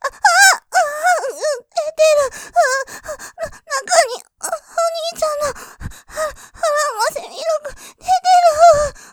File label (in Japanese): 絶頂喘ぎ, ASMR; 射精きた！！; お兄ちゃんの孕ませミルク出てる！; ループで楽しむ！シェフの気まぐれ抜き抜きコース; モノラル